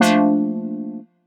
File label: stab bath F, Noise; stabs